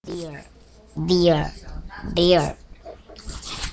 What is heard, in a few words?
deer